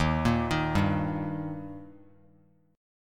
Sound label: Eb Major, down; arpeggiated; 3C,3F,3A,3D